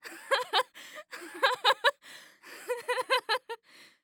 Human Female Laughing

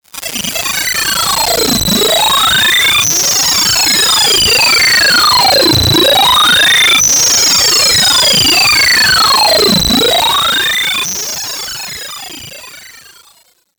Title Transition Data Transmission Computer; Production Element Title Transition

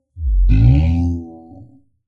Monster; Membrans; Monster Pack 01; GDC Game Audio Bundle

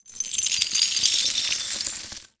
Weapon Glass Drag Sledgehammer On Broken Glass; AdobeCC

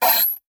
Multimedia Internet CD-Rom Flash Ding

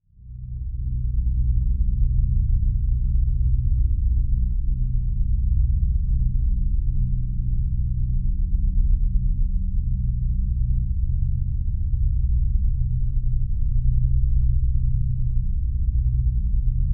Drone Ominous Ethereal Dark; Production Element Imaging; AdobeCC

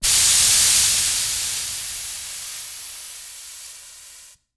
Industry Tool Air Escaping From Air Compressor

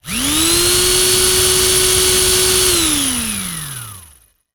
Industry Engine Power Saw

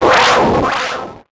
Flash Whoosh Multiple; Multimedia Internet CD-Rom Flash

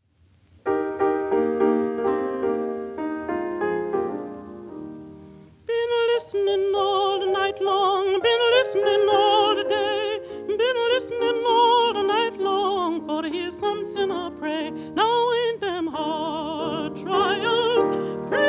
Spiritual; Hard trials; Spirituals

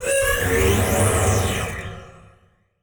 Title Transition Communication Distortion; Adobe; Production Element

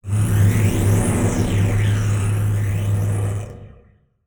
Transition Electrical Distortion; Production Element Title Transition

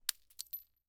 Weapon Bullet Shell 40 Drop On Leaves; AdobeCC

